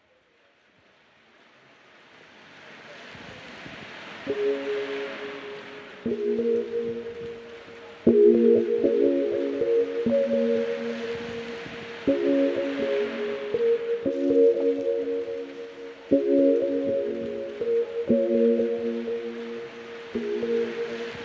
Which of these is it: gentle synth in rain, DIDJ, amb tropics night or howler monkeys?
gentle synth in rain